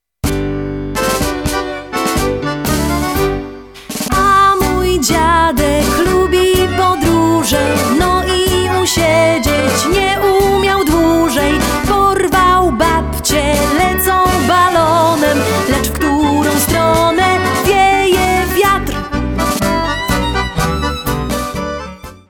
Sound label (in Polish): tango z balonem